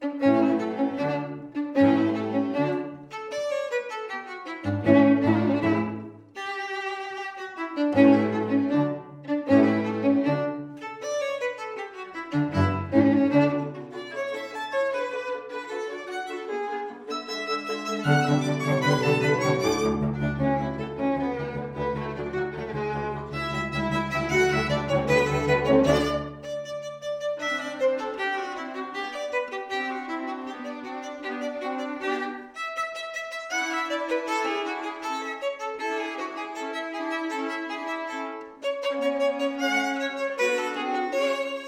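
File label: string quintet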